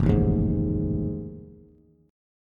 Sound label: F 7th Sharp 9th, Bass; F7#9 strummed